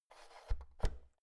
oven knob press out soft

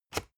spring binder lock

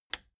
plastic button latch loose release disable

